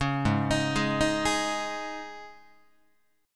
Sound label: G sharp Suspended 4th G sharp, Guitar; G#sus4 arpeggiated; 4,4,6,6,7,7; JGuitar